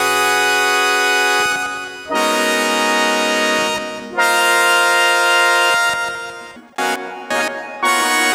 Nazz clouds, Pads; Sonic Foundry (Sony Creative Software); Synchro Funk

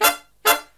Brass Riffs; USB Soundscan vol.29; AKAI

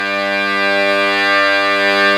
Accordians, Euro. Accord

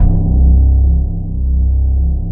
BASS 170 BPM, Drum & Bass; Ueberschall; Drum & Bass Flash Trackz

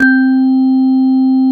Korg CX3, C 1

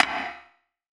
rim, gated reverb; musicradar

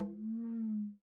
Fast Conga Bend; Sonic Foundry (Sony Creative Software); Joe Vitale Latin Percussion

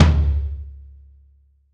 Brush Tom

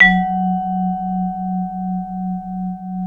MLT VIBE

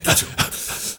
HEY HEY, MALE NOISE, Heart of Africa

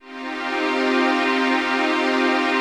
ATMO PAD, Dance Mega Jungle Rave; Best Service

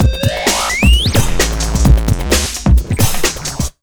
JUMP 130, Big Beat Drumloops

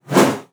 BOW PERC, BASS FINGER; Xsample VOL-5

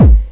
KICK -L, Disco House

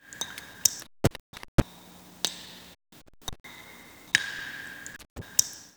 Nature After Rain; X-Static Goldmine

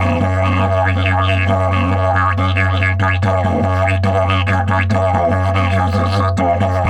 F 140, DIDGE RIFFS

